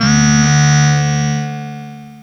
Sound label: Depth Charger, Tonal Atmospheres; Sonic Foundry (Sony Creative Software); Myths of Technology